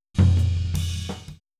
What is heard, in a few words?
Boogaloo Fill Tom Floor, 82 BPM, Drums; Sonic Foundry (Sony Creative Software) - Drums from the Big Room The Mixes